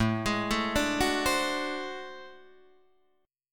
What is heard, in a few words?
A Minor Major 13th, down, Guitar, DADGAD; AmM13; arpeggiated